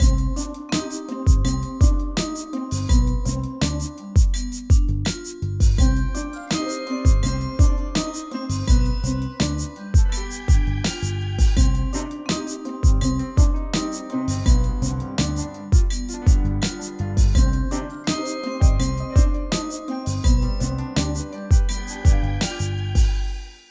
holiday hip hop sneaky; Jamie Coleman, Film Composer

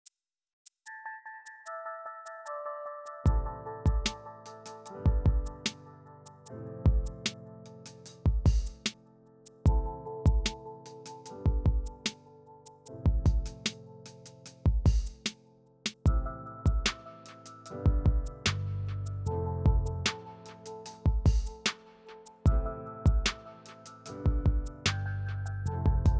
somber groove

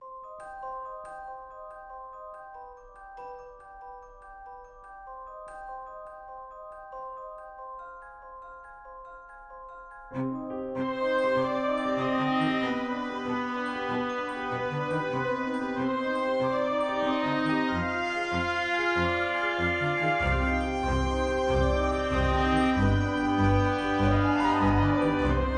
spooky

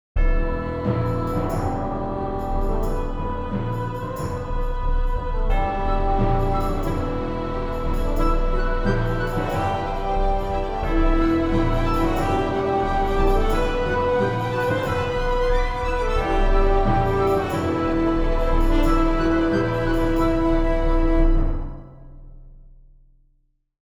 Danger Bad

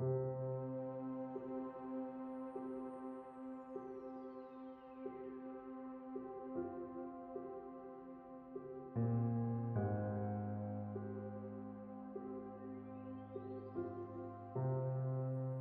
suspense

